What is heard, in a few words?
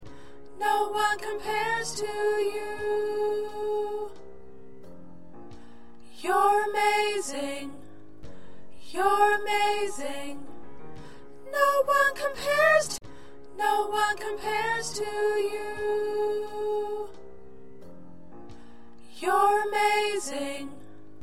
Soprano